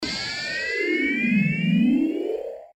notification sound; dragon ball flying nimbus

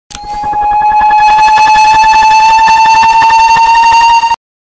cloaker scream; payday 2

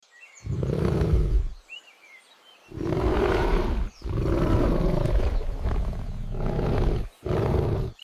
tiger roar powerful ultra realistic

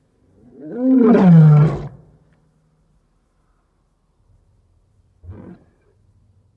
lion roar

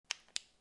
flashlight clicking on

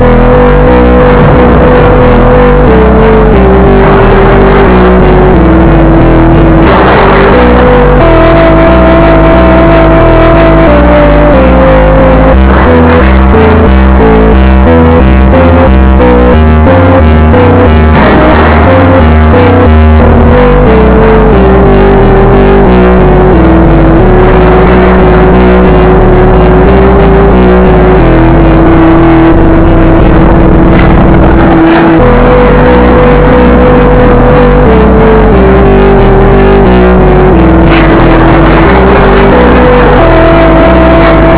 thunderstorm orage, kuala lumpur

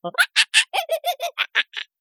cartoon monkey mocking and giggling

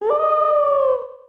wolf howl

